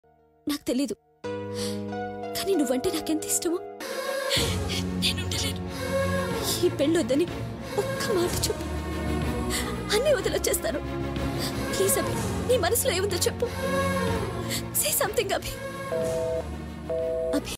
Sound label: background music; manmadhudu